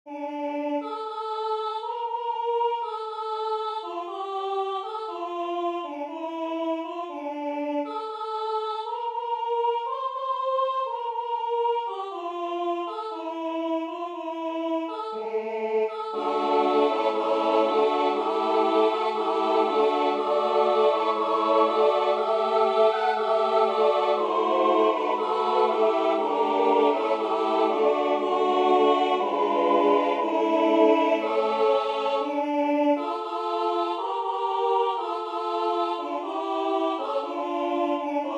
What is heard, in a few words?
Zigeunerlieder tutti